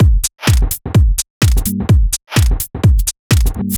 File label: Thong A 127bpm, House Drumloops; 90 s Sample CDs; Zero-G House Fabrik Drumloops; ZG Drumloops